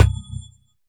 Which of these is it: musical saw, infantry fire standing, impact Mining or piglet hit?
impact Mining